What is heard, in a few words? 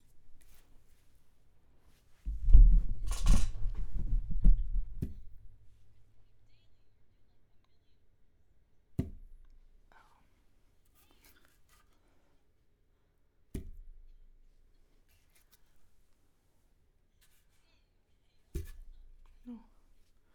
bonk sound effect